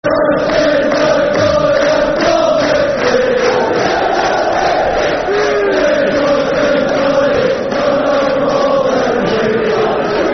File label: Football Chant (Soccer Songs)